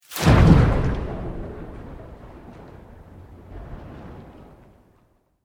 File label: Sweep Organic Medium; Created sweeps, swooshes and other movement effects; Sweeper Sound Effects; Sweepers (Sound Effects:Sound Design)